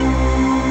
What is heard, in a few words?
ORG C, organ; Best Service ProSamples vol.10; House; AKAI